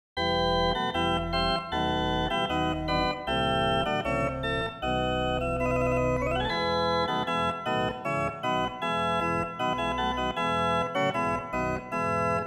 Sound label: Deck the Halls E31 E32